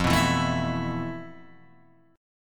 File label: F Major 7th Flat 5th, Guitar Open G